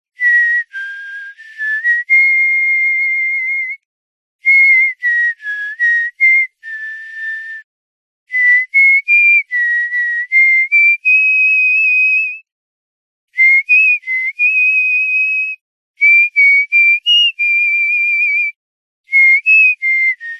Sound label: human whistling tune long